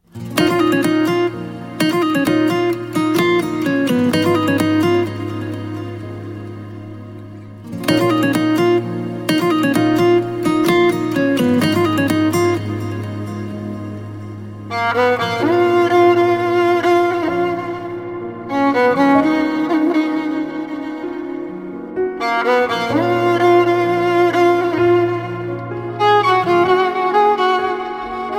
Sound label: sad mobile ringtone